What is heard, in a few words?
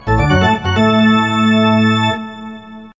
nba message, ringtones sms